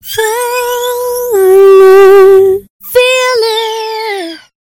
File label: Stereo Flanger Female Vocals Wet Shepard Flangertone; Sonicircuit; Syntheway